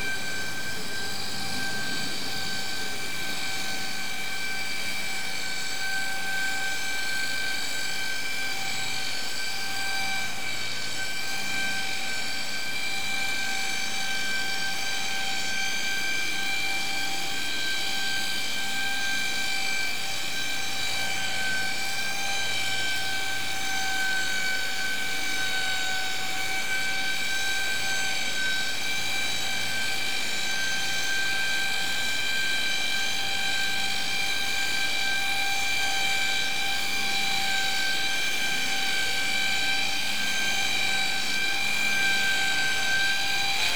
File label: Noise, hydro events